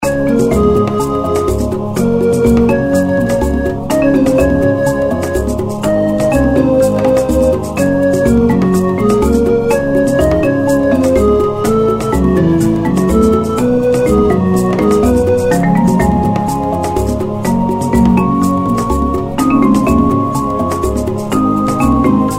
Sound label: reelspin; Africa Run